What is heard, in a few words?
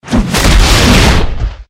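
Dragon attack Up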